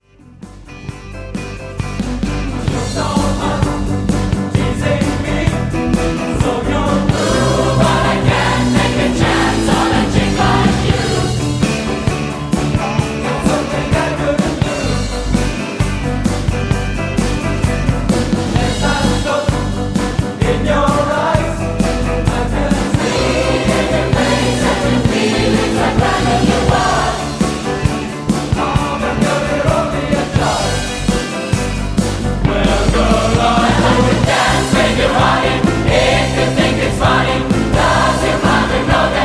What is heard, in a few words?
SATB